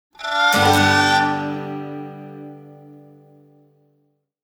Banjo guitar and fiddle Country And Western intro to a hoe-down; Stingers; Sound Design